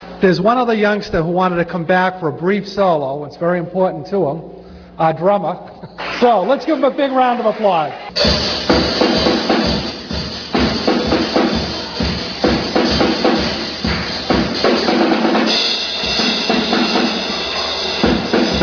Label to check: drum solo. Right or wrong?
Right